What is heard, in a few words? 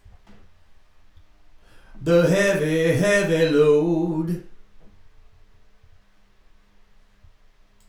vocals